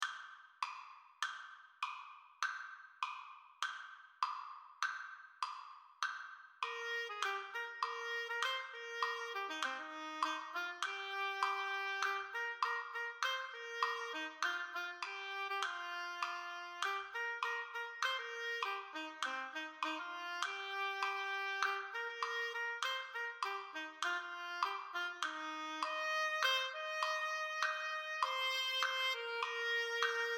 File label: Oboe Slower Tempo; Oboe Only - Slower Tempo